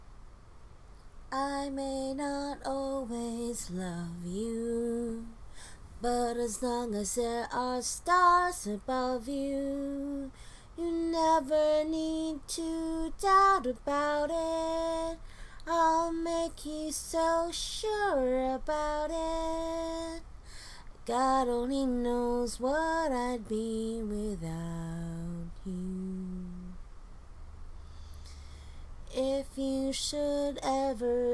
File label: F4A, cover, A Capella